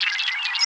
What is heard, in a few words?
signals; Total Control - Action Cutz